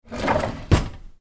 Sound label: Kitchen Drawer Close with Silverware